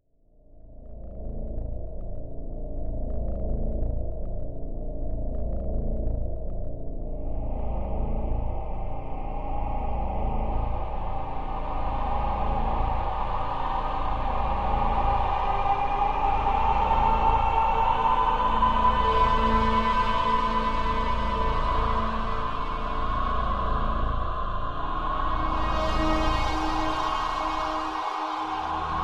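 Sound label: white noise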